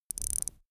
watch wind up

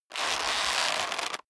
leather creak short hard

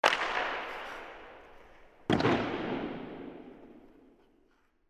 puck hit hard

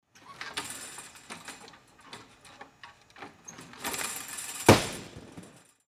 men parallelbar

